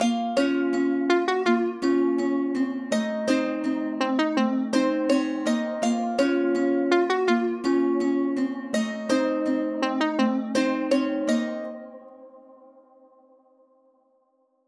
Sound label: Too Late 165 BPM